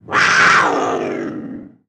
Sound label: Puma Cougar Roar; big cats cougars